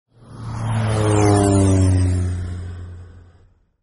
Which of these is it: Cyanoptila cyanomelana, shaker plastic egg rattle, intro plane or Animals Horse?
intro plane